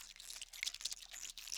RAT EAT

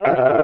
ALPHA ORC DOG Hurt

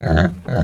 ambient

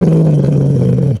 ambient, alpha orc dog

